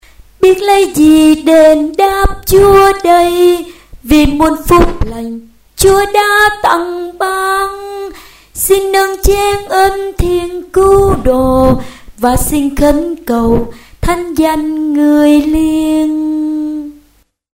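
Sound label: soprano alto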